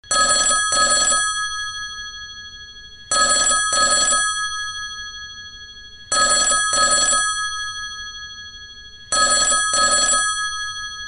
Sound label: old bell rotary telephone ringtone